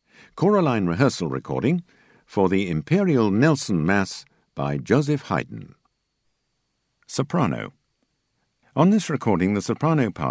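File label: Soprano; Haydn Nelson Mass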